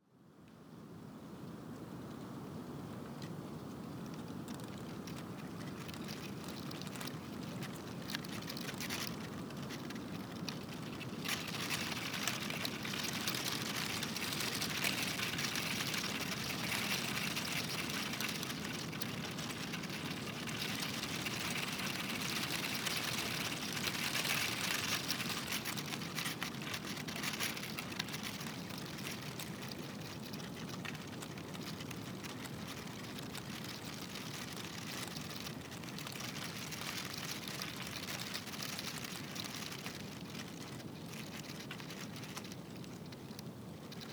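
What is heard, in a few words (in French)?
feuilles mortes et sèches au vent parc national du mont tremblant; portrait sonore hivernal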